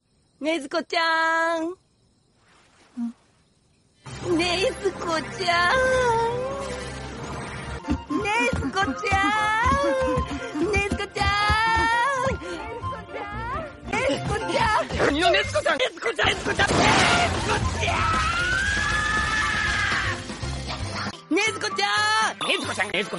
korean cartoon theme; zenitsu says nezuko chan

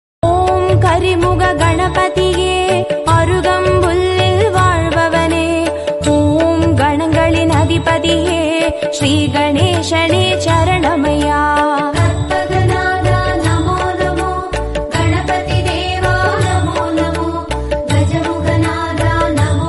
bhajan, bhakti devotional; vinayagar chaturthi

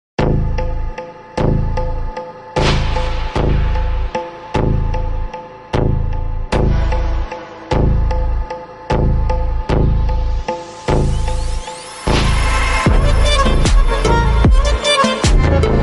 remix

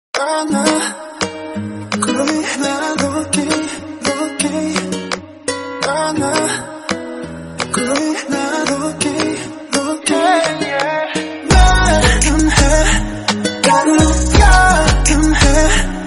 english song

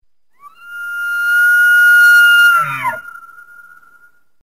elk noise

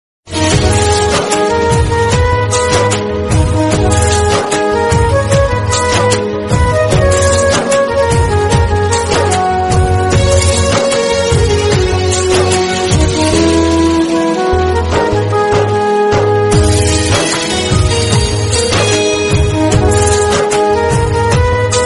Romantic

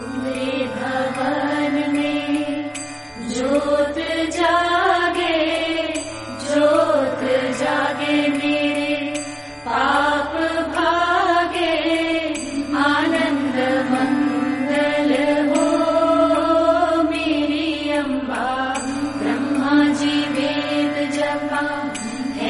bhakti song

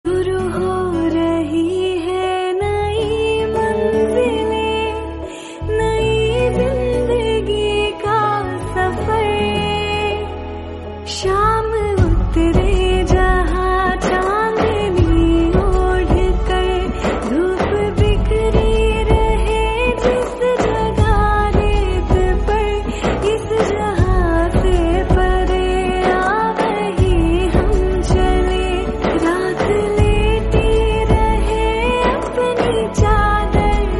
male; kgf chapter 2

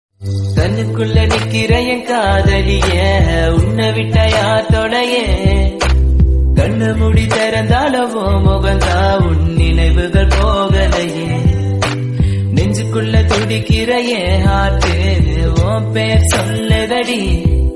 Tamil song

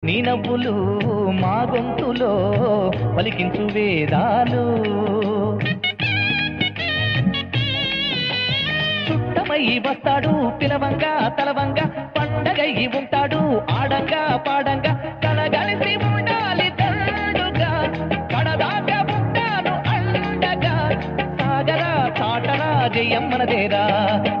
telugu old song

